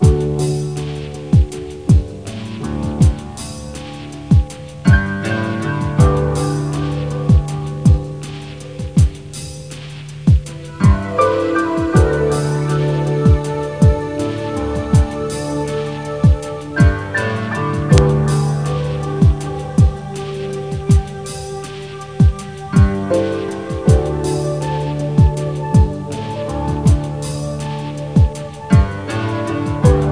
Mellow

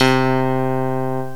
PIANO; piano 3

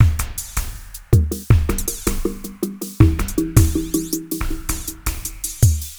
DRUM LOOP ambient 160

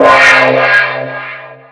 Sudden Death; Effects; Worms 2

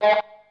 Wah gtr; guitarras

